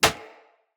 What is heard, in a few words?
Bow Impact Default; Weapon Impacts; Player; Bows; Environment; Hunter; Envoy Hunter Bow